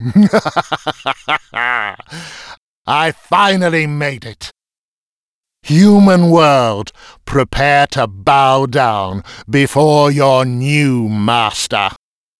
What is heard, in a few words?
Outro Fail US; arthur and the revenge of maltazard; wii